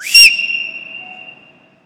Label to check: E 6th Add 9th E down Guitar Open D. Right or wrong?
Wrong